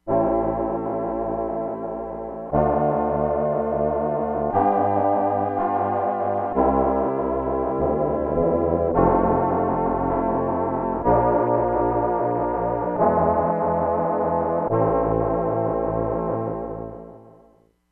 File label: MALE SPACE CHOIR, Crumar Bit 99; Factory Programs